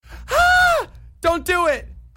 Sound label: scream